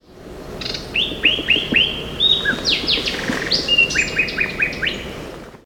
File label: Sinaloa Wren burr